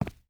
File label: footstep boots concrete run; Rust